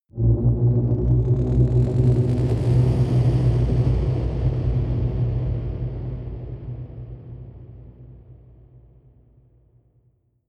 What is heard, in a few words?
whoosh tonal rumble rise